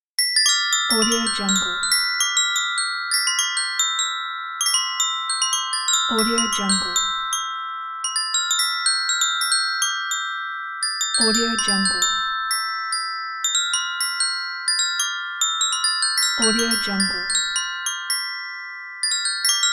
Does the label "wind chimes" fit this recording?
Yes